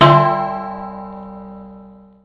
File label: gongi; Clangy Crunchy Foundsound Kit